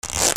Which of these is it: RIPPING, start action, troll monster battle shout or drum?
RIPPING